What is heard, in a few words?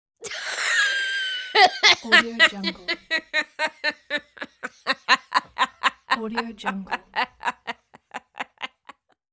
young teenager laughing